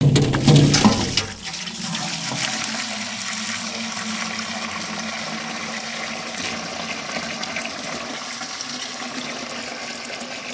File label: bucket, house; CosmicRage